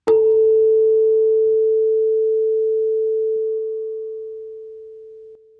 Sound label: tone a; PiA2019 Experiment 22